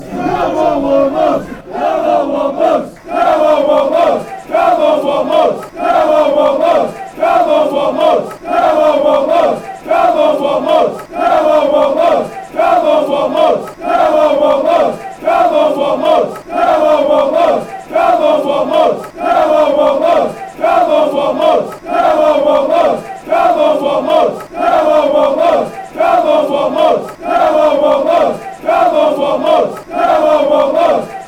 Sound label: come, chants; wombles